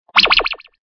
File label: new record; swipe it